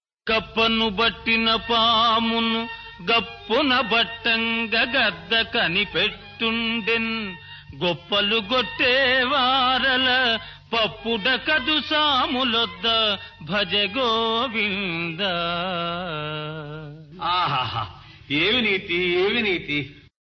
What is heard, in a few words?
Padyam; Appu Chesi Pappu Kudu(1959); Kappanu